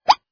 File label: plop